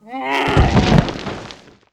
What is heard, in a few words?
death camel; Alexander